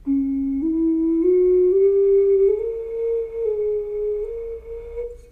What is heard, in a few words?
okarina